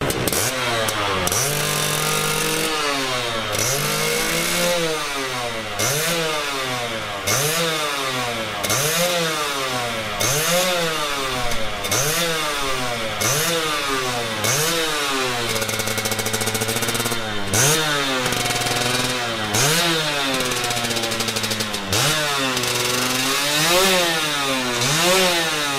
Tieng net po xe may

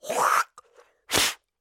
Tieng khac nho nuoc bot

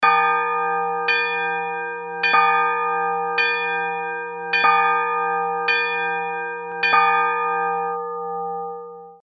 Âm thanh chuông nhà thờ rung

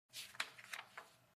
tieng nhat luom cam lay to giay

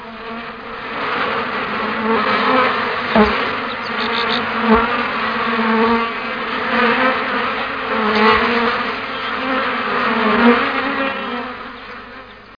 Tiếng bay ruồi vo ve

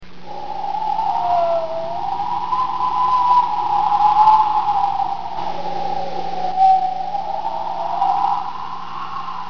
gio thoi manh co tuyet

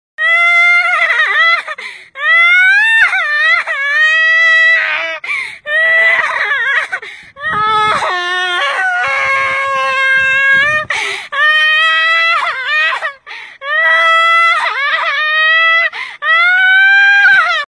tieng nurat khoc

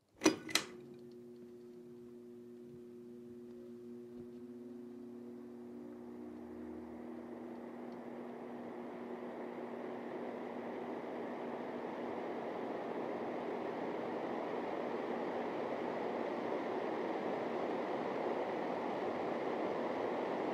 tieng tat bat quat tran keu

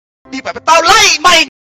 am thanh tao lay may pewpew

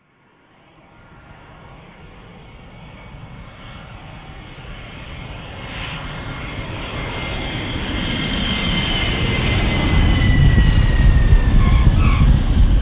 Tieng may bay cach canh

